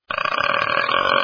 big burp

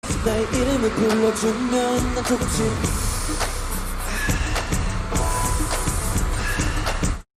sus vocals

